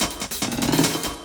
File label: LOOP SD; Ueberschall; Drum & Bass Resonance; 145 BPM